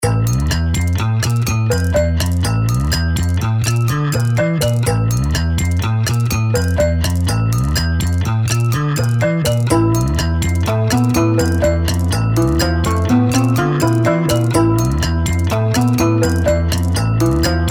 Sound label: folk; エスニック：民族03; maoudamashii